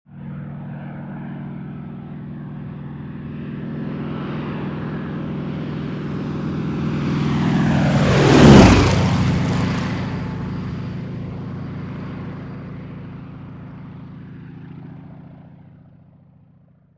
Fast Approach With Engine Whine, Growling Low-speed By, Engine Chatters As It Draws Into Distance. Medium To Distant Perspective. Prop Plane.